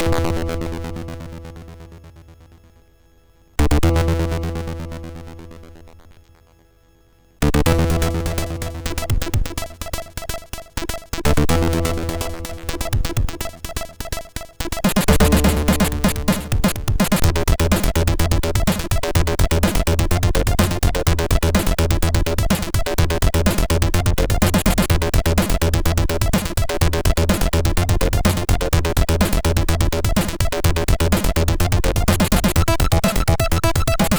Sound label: note MOS6581R3